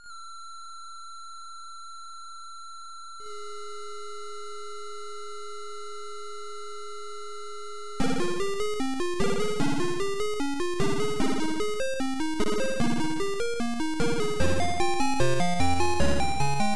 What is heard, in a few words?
Techno AYx2 Turbosound; Twilight Techno